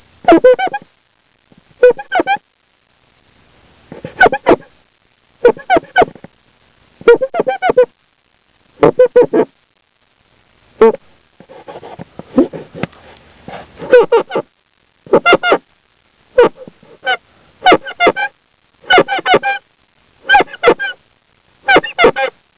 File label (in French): polir un verre, sound effects, choses diverses; pinnacle; french